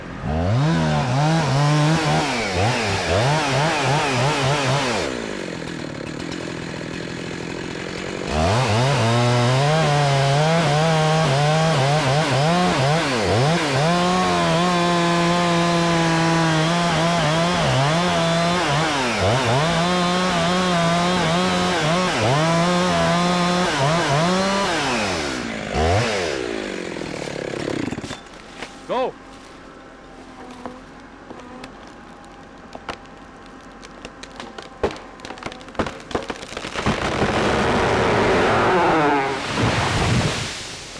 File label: Drops A Tree; Zack